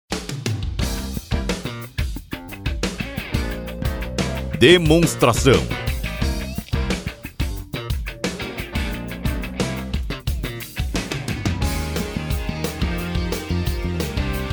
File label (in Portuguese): Trilha